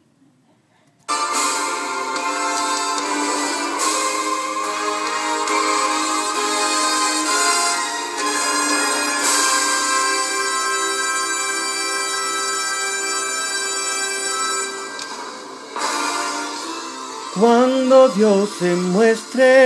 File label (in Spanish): Solo; Vere a Cristo; Coro Juvenil; himno